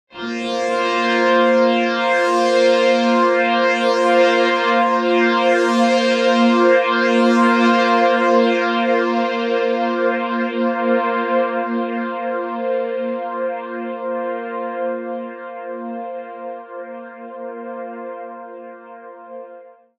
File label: Saw Pad Clouds und Phaser; Dawesome LOVE 1.0.1